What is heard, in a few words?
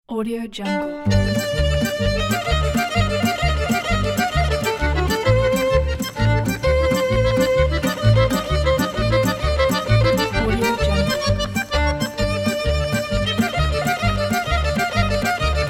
italian tarantella